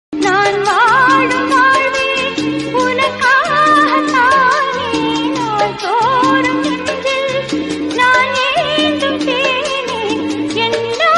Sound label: Female